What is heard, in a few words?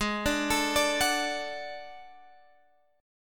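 D Major, A flat; D/Ab; arpeggiated; JGuitar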